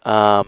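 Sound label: umm, Conversational Grunts in English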